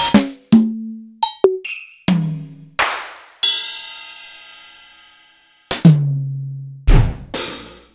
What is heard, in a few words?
Roland XV 5080; m8